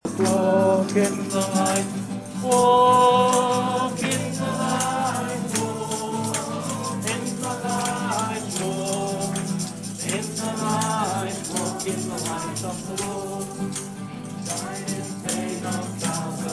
group in pope john i chapel in lourdes singing walk in the light